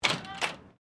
Door Pivot Exterior Aluminium Flyscreen Open; Various doors; Miscellaneous Doors

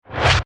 Production Element Whoosh Reverse Fast; Whooshes; Sound Design

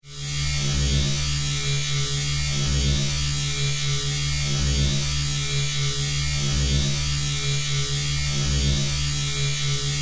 Force, Field, Energy, Source, Scifi; BlastWave

